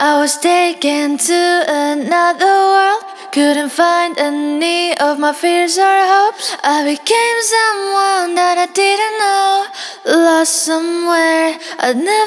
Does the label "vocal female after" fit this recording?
Yes